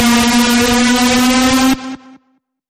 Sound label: Bend, A sharp; Feel The Bend